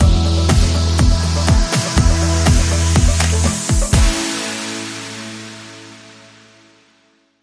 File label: Bumper